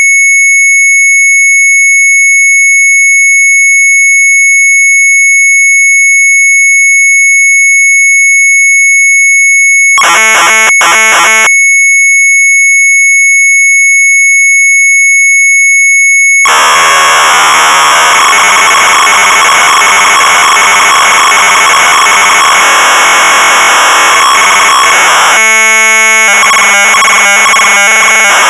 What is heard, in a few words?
Sharp MZ800 Tape; OTHELLO-3; retromelsarchive